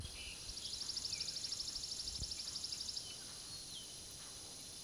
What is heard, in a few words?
Chipping Sparrow Spizella passerina